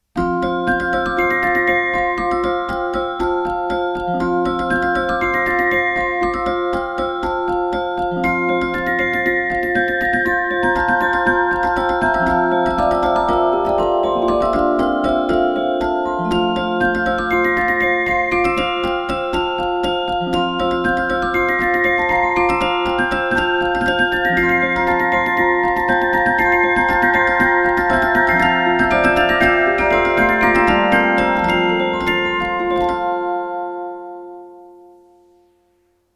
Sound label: Renaissance, Spieldosen